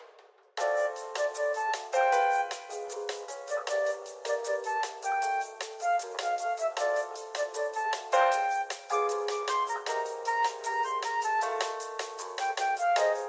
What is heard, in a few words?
elevator mus; Golden Crayon Sounds